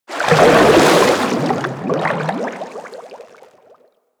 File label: roll whoosh; Warframe; Vehicles; Sharkwing